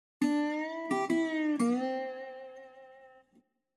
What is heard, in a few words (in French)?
VIRGULE DOBRO SHORT